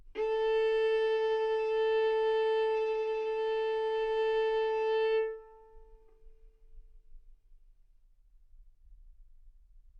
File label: Violin arco A4 B4; mf; sul A; stereo